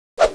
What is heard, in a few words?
flashlight swing; weapons; flashlight zm